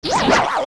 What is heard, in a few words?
Flare Dancer Hit